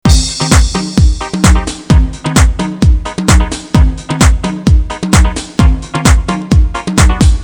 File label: depressing rock music